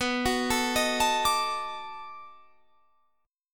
B 7th Sharp 9th, down, Guitar, Standard; arpeggiated

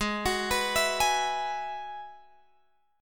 Ab Minor 7th Sharp 5th Ab, Guitar Drop D; down

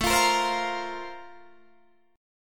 A sharp Major 7th Suspended 2nd A

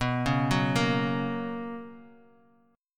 B Major 7th Suspended 2nd Bass Standard, chord; BM7sus2; arpeggiated; JGuitar

